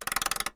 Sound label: handle wind; CosmicRage; planetoid